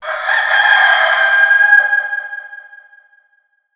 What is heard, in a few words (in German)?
Hahn Tagesanbruch